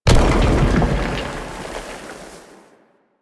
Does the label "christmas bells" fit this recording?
No